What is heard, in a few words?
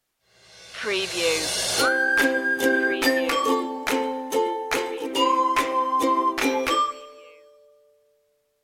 jolly ukulele stinger